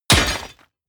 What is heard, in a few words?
clang rattle dirt; whooshes rises; hits impacts